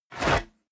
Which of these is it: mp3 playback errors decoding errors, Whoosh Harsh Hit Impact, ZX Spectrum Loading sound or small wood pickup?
small wood pickup